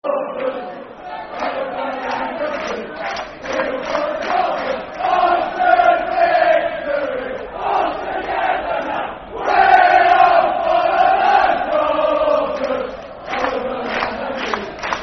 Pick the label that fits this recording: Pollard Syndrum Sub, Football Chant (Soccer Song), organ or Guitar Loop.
Football Chant (Soccer Song)